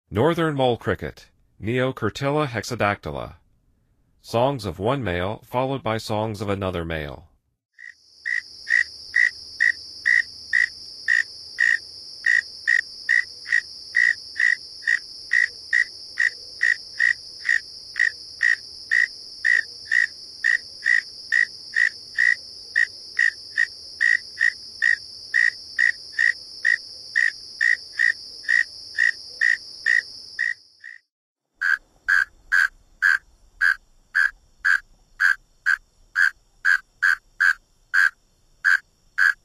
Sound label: Northern Mole Cricket; N. America